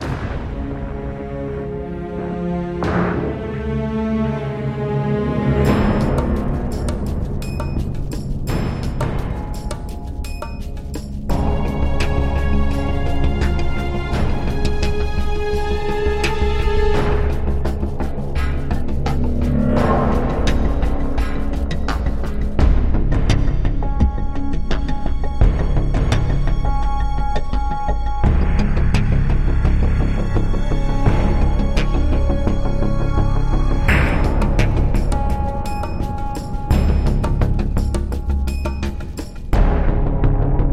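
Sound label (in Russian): криминальная музыка без слов для фона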